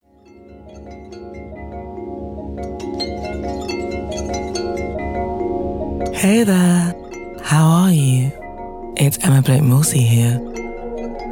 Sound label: Nature Walk Soundscape; Nature As A Resting Tool; Come Together Weekender; Claiming the Right to Rest